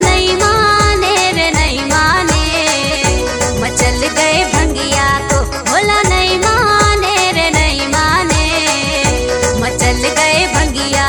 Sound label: bhakti ringtone